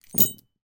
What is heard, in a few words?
game metal playing pieces drop on carpet; monopoly